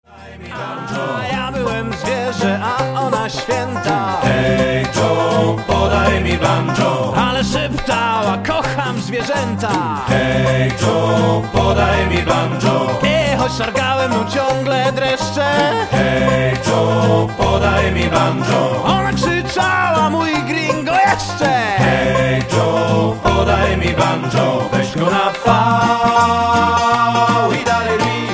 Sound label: banjo